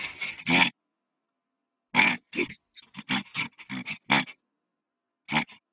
chronic laugh; Chronic Snort

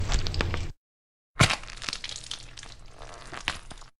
blood splat; jarquavius dies

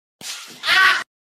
Bald Slap Sound

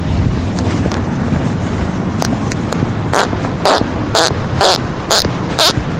fart

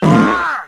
fart

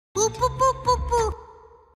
laugh; monokumas

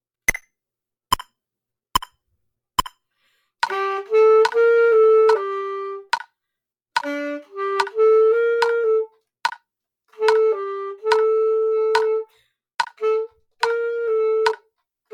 The Cold Wind Metronome

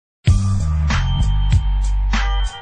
mission complete sound; gta san andreas

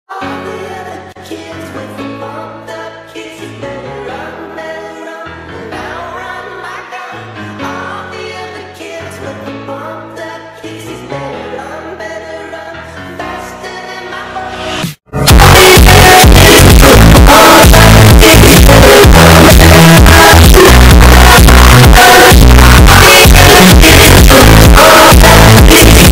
LOUDEST BASS DROP EVER EARAPE

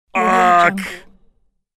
male loud ugh mistake shout

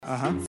Ahammm

